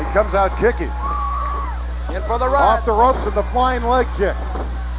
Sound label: flying leg kick; heroes of wrestling